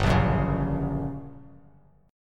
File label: G Minor Major 7th Flat 5th G, Bass Standard; strummed; JGuitar